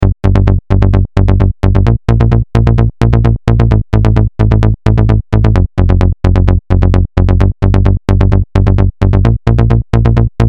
SH01a Square Italo Bassline Loop

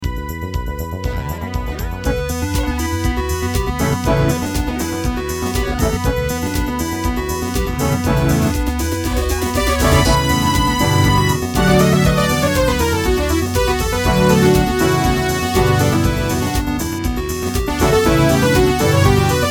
Dance XV5050

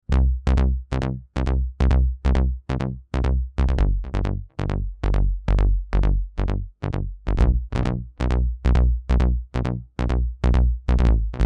LFO Mod

